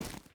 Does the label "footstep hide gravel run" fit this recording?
Yes